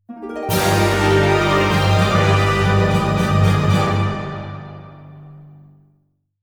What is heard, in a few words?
Scenario Clear Jingle; Victory Feast